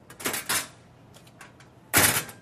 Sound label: Locker Door Open, Close